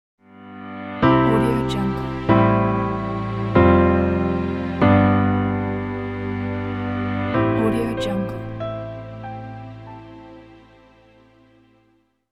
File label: elegant and calm piano logo